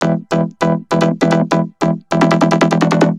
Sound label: Vocobeat; Sonic Foundry (Sony Creative Software)